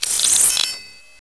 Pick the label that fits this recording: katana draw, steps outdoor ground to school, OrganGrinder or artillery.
katana draw